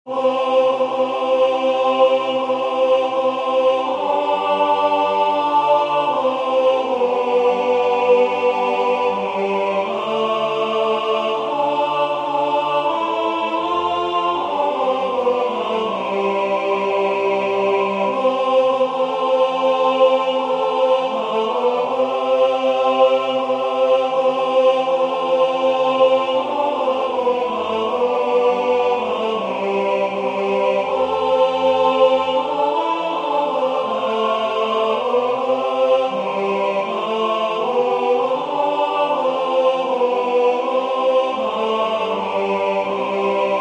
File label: tenor; Benedictus qui venit